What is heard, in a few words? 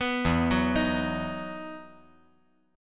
E Major 7th Suspended 2nd E; chord shape